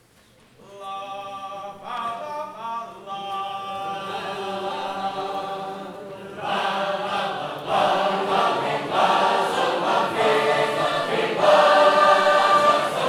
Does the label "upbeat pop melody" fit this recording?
No